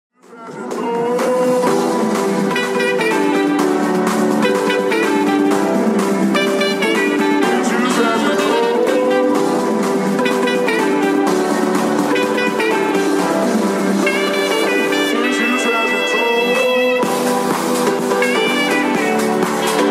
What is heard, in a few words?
Sax